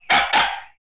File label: Bandit Bark